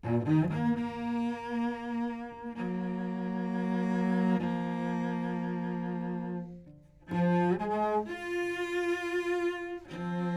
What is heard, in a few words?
cellos